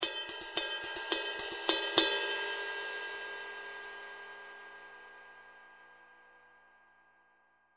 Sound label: 20in Custom Session Ride, cymbal